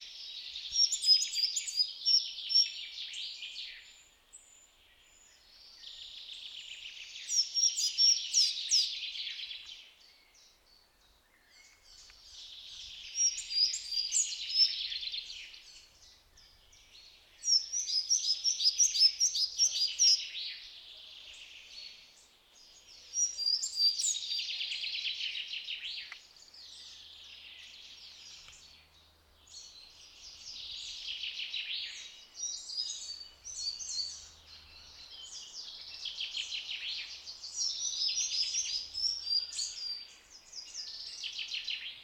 Balkanlugsnappare

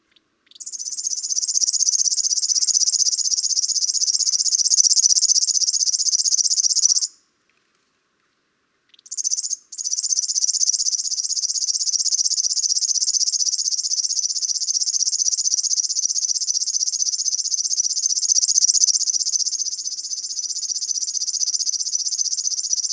Flodsangare

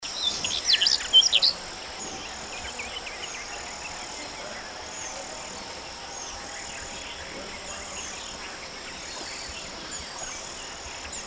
Svarthakad buskskvatta

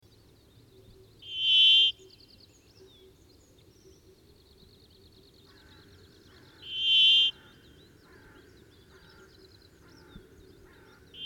Bergfink

